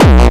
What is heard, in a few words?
bombo, kicks, Hardcore